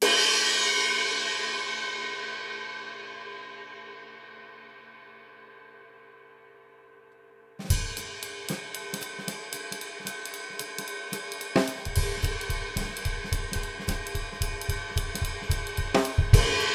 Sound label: sabian power bell